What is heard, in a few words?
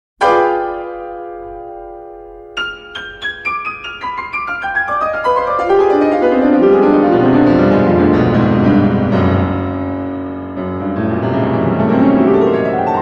Piano